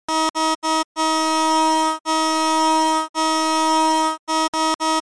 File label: SOS; colregs